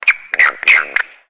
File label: Frog Tree Ribbits